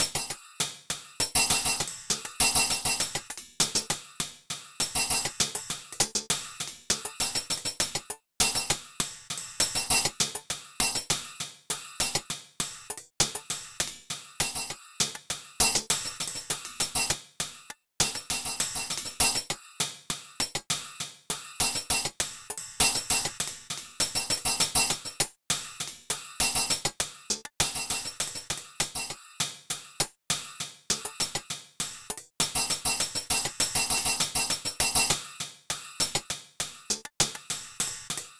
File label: Cymbalz N Hatz More, Percussion Combos, 100; M-Audio; Pro Sessions VOL-38; Abstract World Fusion 2